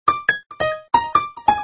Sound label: piano